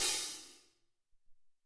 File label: O Amb Hat, Alesis SR-18